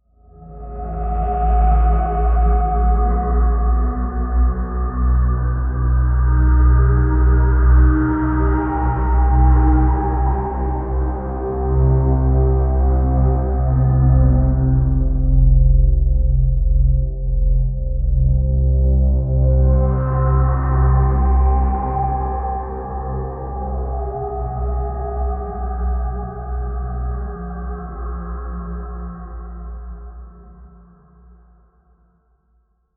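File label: create horror story background, atmospheric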